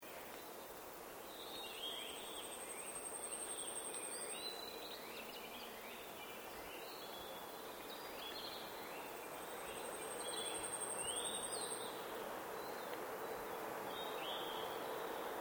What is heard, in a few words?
AMBIENCE TAZAWAKO FOREST BIRDS LOOP; Recorded in Golden Week in Japan 2008; Forests and Jungles